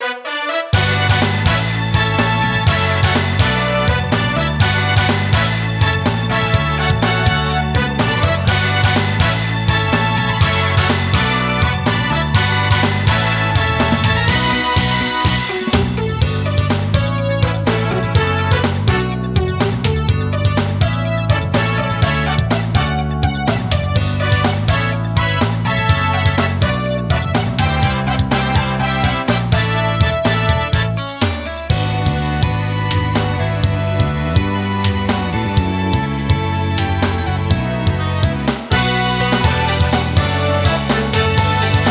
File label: Music Menu event